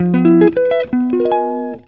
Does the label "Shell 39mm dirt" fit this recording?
No